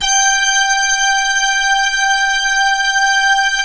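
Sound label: synthesizer, digital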